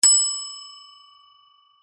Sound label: ding